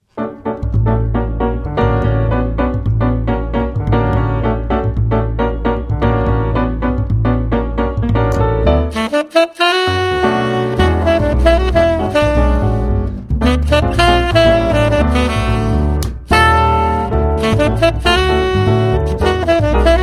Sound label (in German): instr Sax Trio, Jazzband mit Saxophon